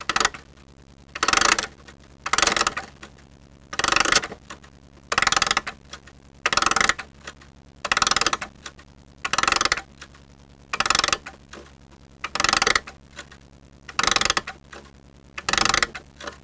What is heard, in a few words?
winding; WAV Sound Effects; houshold; clokphon